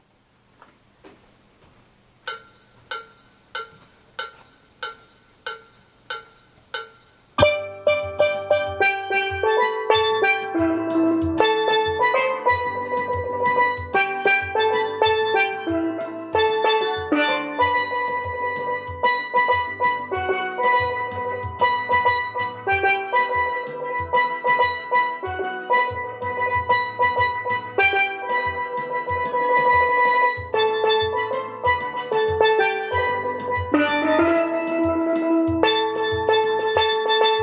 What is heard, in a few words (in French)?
Ténor